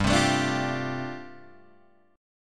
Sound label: G 9th Suspended 4th G down Guitar Open G